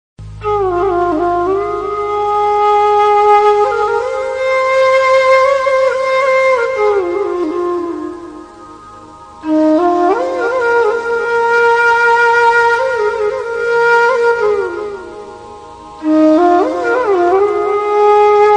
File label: Heart Touching Sad Flute Ringtone